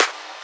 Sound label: Snares & Claps; Vengeance Samples